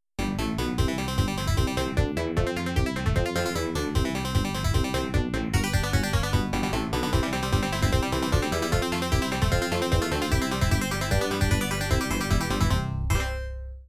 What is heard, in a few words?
Banjo Bonus, pinball; Cactus Jack's; Gottlieb; arcade